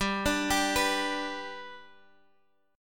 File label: G Major G